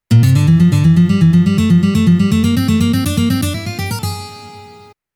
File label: solo